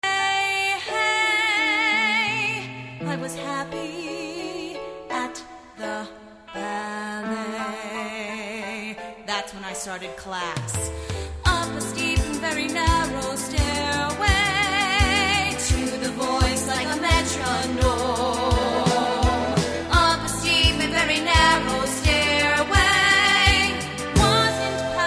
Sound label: chorus